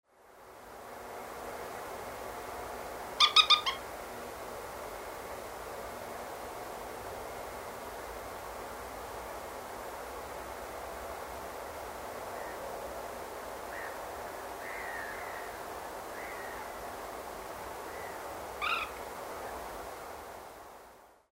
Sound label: moorhen nocmig